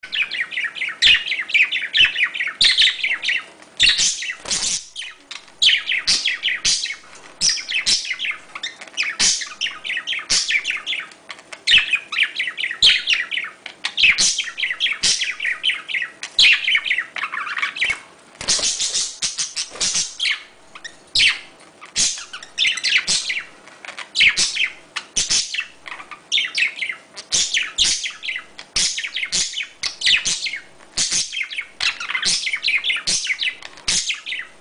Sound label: mating sound budgie